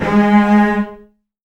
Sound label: Virtuoso Strings, TRM FX